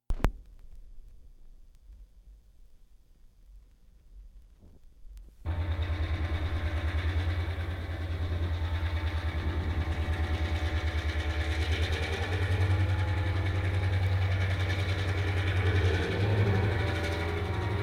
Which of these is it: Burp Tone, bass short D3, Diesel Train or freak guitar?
Diesel Train